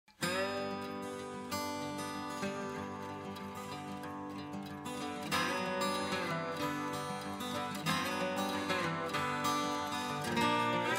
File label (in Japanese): ハイパス ローパス ハイパスのみ